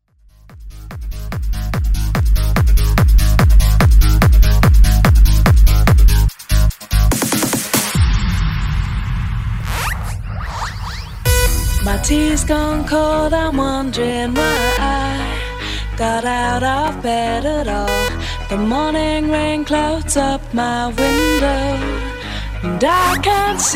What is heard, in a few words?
Techno MashUp